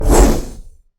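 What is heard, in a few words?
Rocket launch fast; Hovl Studio; SFX Battle sounds; WhiteMan Unity2D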